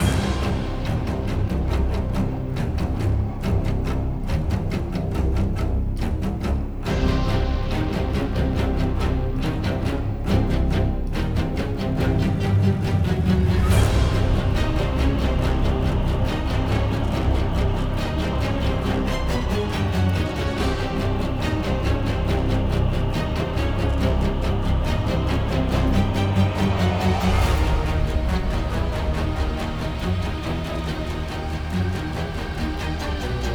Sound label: Abyss Battle Loop; Hollow Knight: Silksong